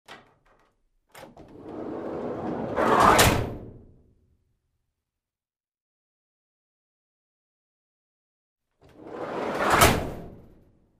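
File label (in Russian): закрытие боковой двери грузового автомобиля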